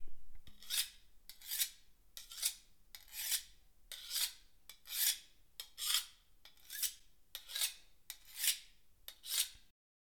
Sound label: Knife Sharpen